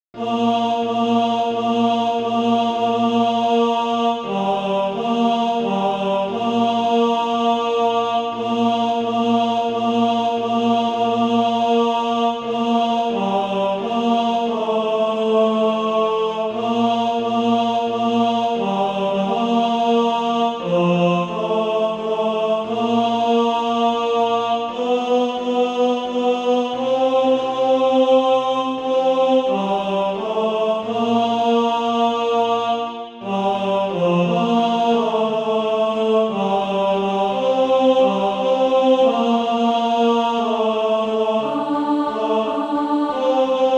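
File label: tenor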